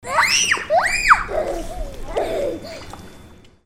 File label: PEOPLE